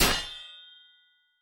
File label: Weapon UI; Fantasy Interface; Fantasy Sounds Bundle